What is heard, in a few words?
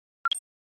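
Flash Beep Electronic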